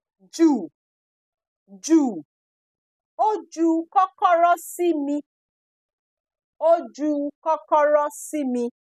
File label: Throw